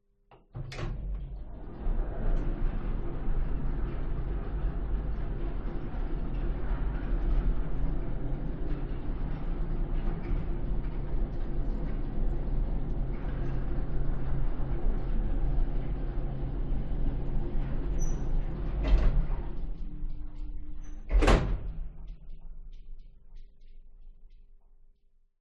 Industrial Elevator Interior; west wolf

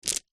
necklace small stones pebbles set down carpet

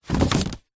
office file folder wallet card close

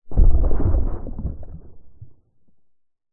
foley heavy item place down underwater pov; zapsplat

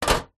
household bbq tongs set down on metal bbq; zapsplat

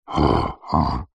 yeti monster grunt breathy; horror; zapsplat

